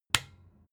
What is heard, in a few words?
desk lamp on hard